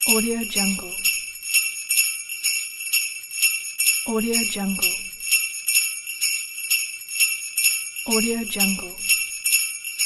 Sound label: jingle bells xmas